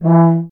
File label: tuba; Miroslav Vitous - Solo Instruments VOL-2